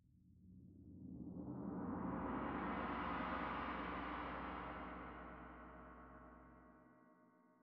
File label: Tam-Tam Front